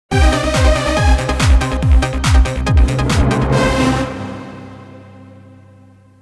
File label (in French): Emission link, jingle; Jingles medley